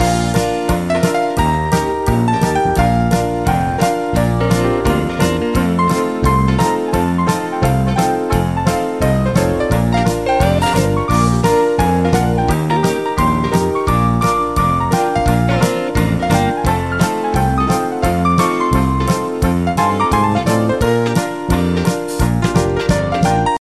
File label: old pub music, ringtone